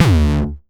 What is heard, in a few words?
909 Grime Layer 1 - D2, Kicks; Mbase 11 Kicks